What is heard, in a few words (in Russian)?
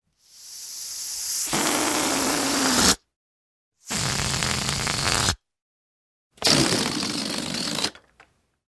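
спускание воздушного шарика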